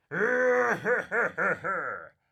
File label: Man Laugh Old Sailor